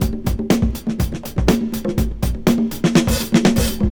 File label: house loop